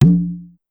Conga Lo Up Fast, Pitch Env; Clavia DDrum-AT; Pitch Env Various

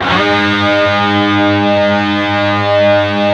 PRS, Guitars, PWR SLID

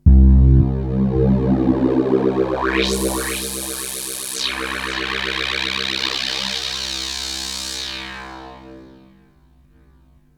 Korg Prophecy hits, arps and fx

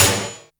Snare, Boss DR-660, Drum Machines; 90 s Sample CDs; 300 Drum Machines

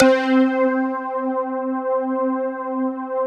SYN K-2000, Roland

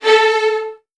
Virtuoso Strings, 16TH; 24 VN; ILIO; Kirk Hunter

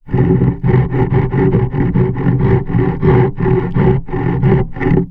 Hand saw, contact mic, Mechanical; musicradar; contact mic madness; Raw

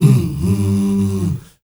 male hum, Heart of Africa; Spectrasonics

